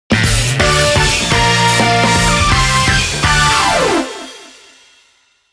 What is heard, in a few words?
Victory!; Everybody's Golf soundtrack